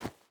footstep boots dirt run; Rust

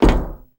sheetmetal walk

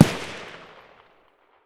m1a fire far; Escape from Tarkov

